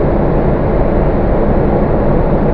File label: ship engine room outside; SpecOps2 MPlayer Demo